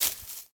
Foot Weeds Shoes Walk

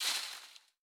Disc Rico Tree; Boomerang Fu

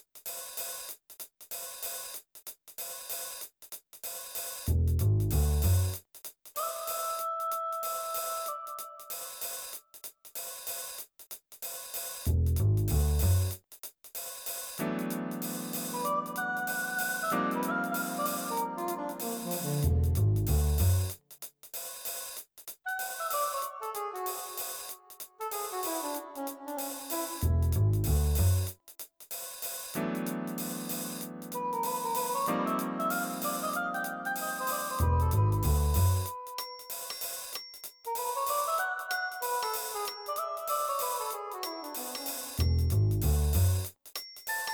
Action Beat; kuro no juusan gamerip

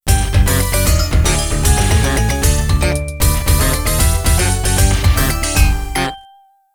Game Over Music

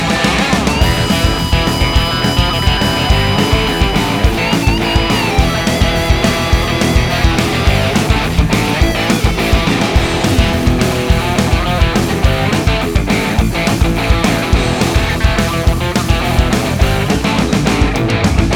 MUSIC ROCK; Lego DC Super Villains 2018 Nintendo Switch

